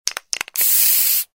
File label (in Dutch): shake en spray luider